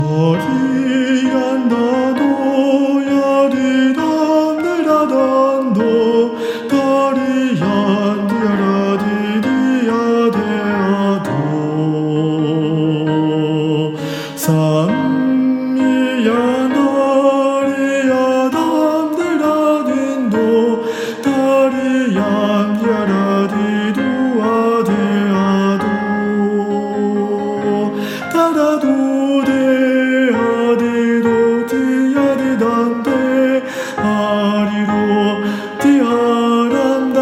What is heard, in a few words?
soprano